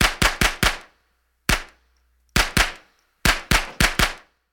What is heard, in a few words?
several shots from small caliber rifle